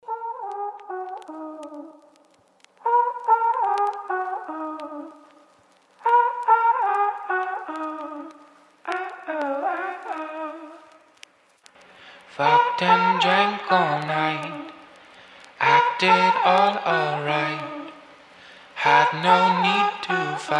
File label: extreme bass; carreta